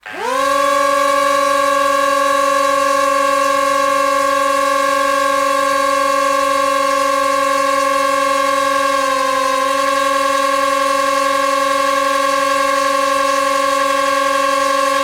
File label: toys drone or rc plane motors whir long